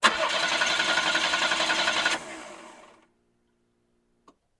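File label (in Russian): zvuki startera avtomobilia, car starter sounds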